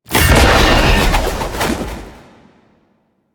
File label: activate; Smite; god Kuzenbo v2